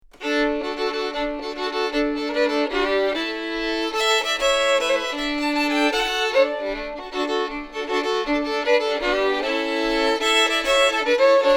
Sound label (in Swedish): schottis; efter bror strand; Gamleby Reunion; korrofestivalen